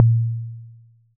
huge tom sample a sharp key, Loudest frequency: 133H; tom drum